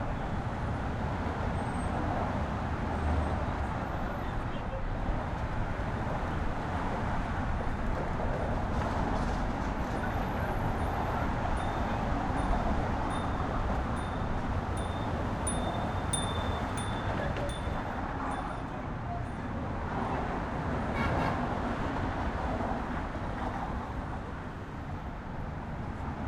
BUSY STREET bridge pathway, car traffic, bike path, few tourists, Brooklyn Bridge New York; Busy Streets Ambience